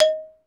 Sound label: XYLO; Roland - LA Composer vol. 1; STANDARD PRC